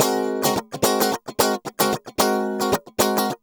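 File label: GUI 110, guitar; Best Service Gigapack 2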